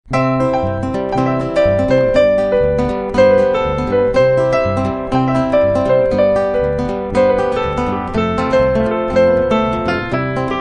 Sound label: texas jingle